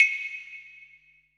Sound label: HIBLOCK, PERC; BPC PERC